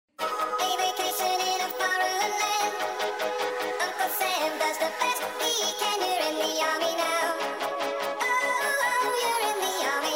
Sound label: rap